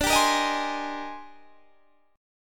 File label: D sharp Major 13th, D, guitar chord